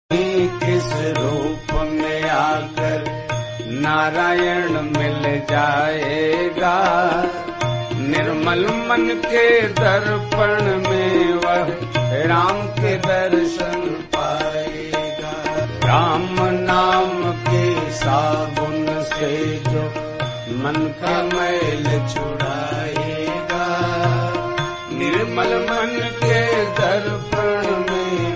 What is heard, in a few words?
Bhakti Ringtone